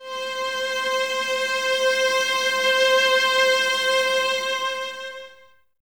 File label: violas C 5, String Ensembles; Miroslav Vitous; VLS 1+2